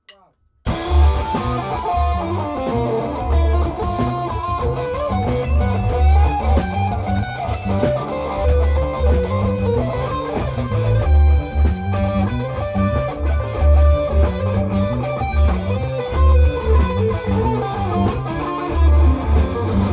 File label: Echoes Guitar Gone Wild